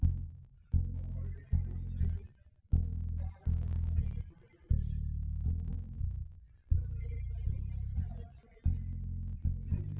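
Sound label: nodrums instantaneous source; SiSEC08; SiSEC underdetermined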